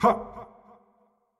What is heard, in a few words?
Effort Tiny; Marv